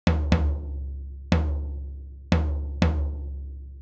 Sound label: Pearl Tom Floor; hydrogen